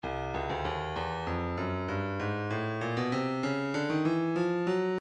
stylus breakbeat midi 097 bpm; Genghis Khan

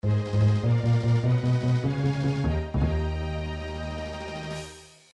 victory fanfare; super nintendo; sequenced; ardy lightfoot

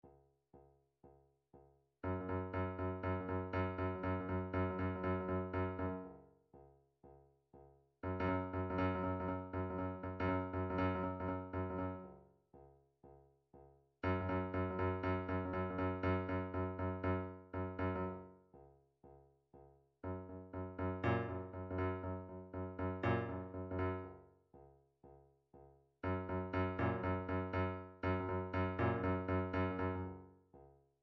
drum trax hats

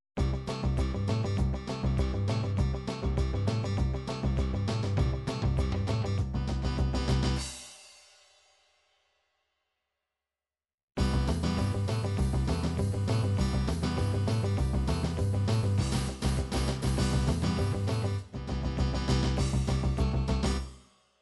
beach, midi

